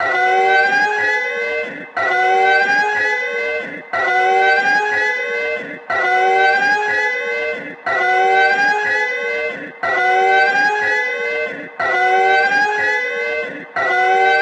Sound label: siren, alarms; Google; Google Sounds; Play It Loud